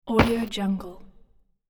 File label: kick